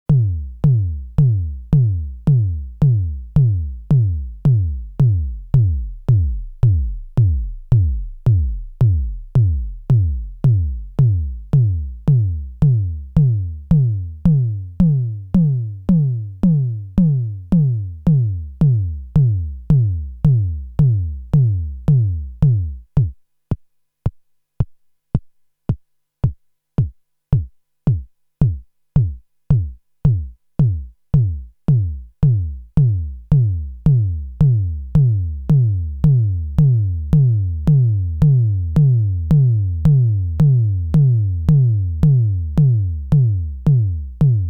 AVP Synth ADS 7 Bass Drum

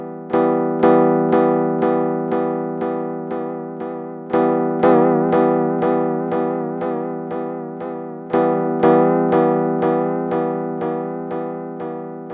Wobble Flutter; Bendiub

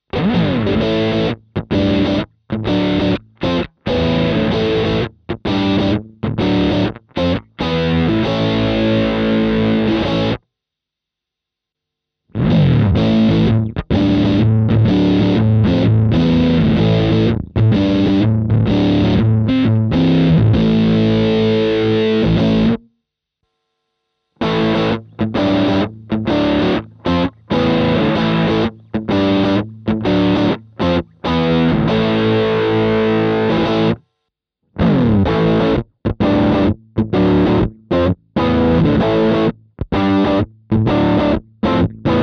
EONS Mode I bis V Gain mid Rhythm; Volate

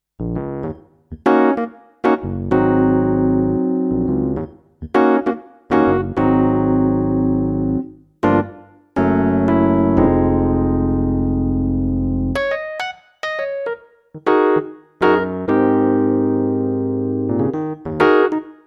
Yamaha CLP575 Stage EPiano

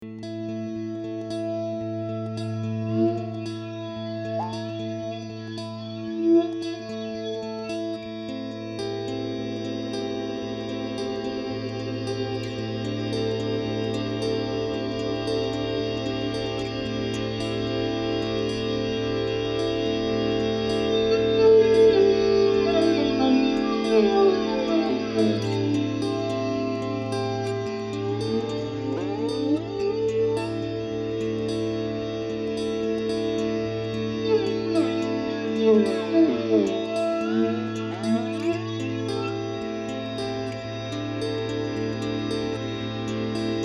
Superego plus Multimod Flange

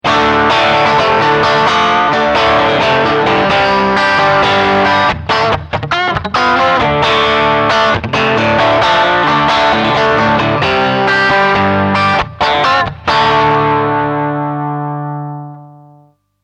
Crunch Light

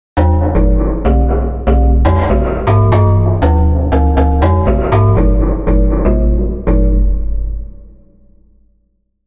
SQ80 Strange Sequence Reverb; EIII

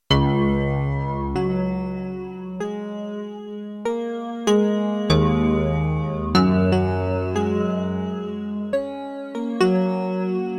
Korg minilogue xd

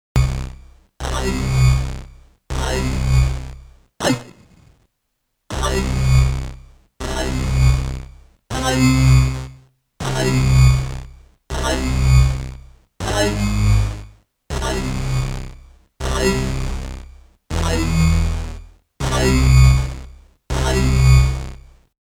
System-1m ring thing dalek

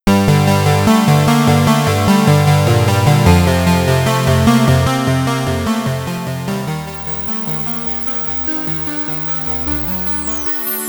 iMini Sync Poly Filter Reso

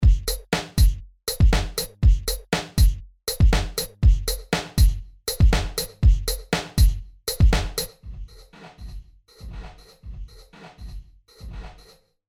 Lexicon Room Drums

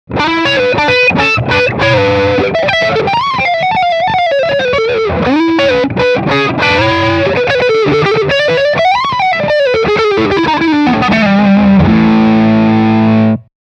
Drive

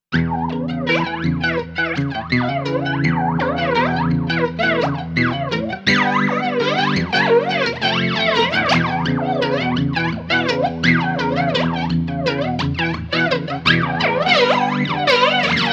bias flanger tweak

